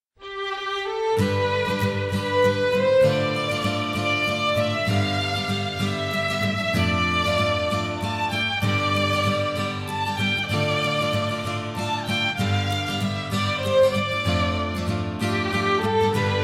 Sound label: bgm